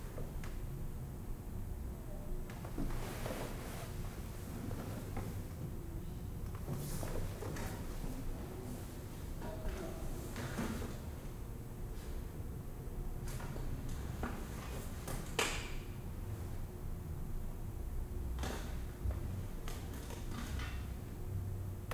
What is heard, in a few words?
classroom loop